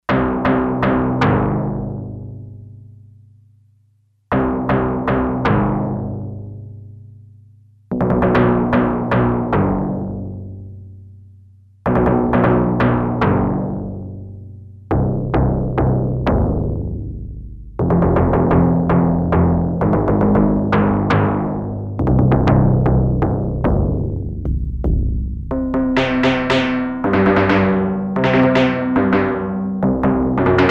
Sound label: Timpani, Yamaha TX802; Factory Patches; Voices